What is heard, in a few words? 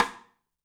MARFSN, 3D Drums, X STICK; 3D Sound